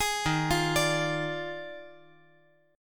D sharp Major 7th Suspended 2nd Suspended 4th; arpeggiated; JGuitar

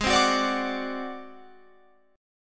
A flat 7th Flat 5th A flat Guitar Open F, chord; 20,19,18,21,21,21; strummed

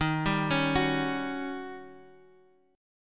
D sharp 7th Sharp 9th D sharp

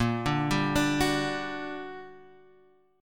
G Minor Major 7th, Bb, guitar chord

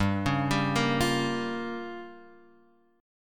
G Minor 7th Flat 5th

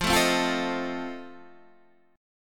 F 7th F down Guitar Standard; F7 strummed

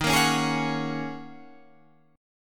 Ab 7th Suspended 2nd Suspended 4th, chord

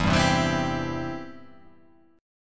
E-Minor Sharp 5th

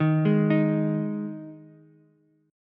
G sharp 5th G sharp Ukulele; arpeggiated; JGuitar

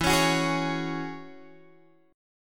E 6th Add 9th E down Guitar Open G

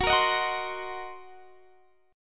Gb 6th Flat 5th Gb down Guitar DADGAD

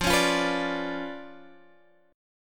C sharp 7th Flat 9th, chord

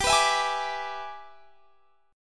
E 7th G, guitar; E7/G; strummed

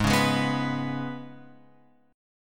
G sharp Minor Double Flat 5th G sharp; {4 4 6 4 X 7} strummed

